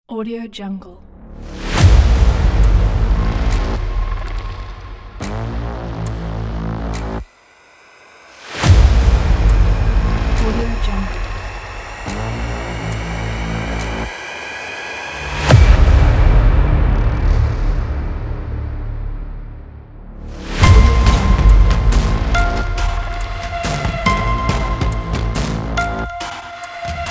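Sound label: action hybrid trailer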